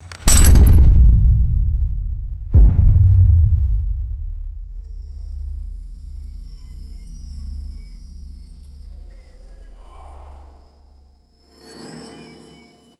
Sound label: heavy retro mecha metallic footsteps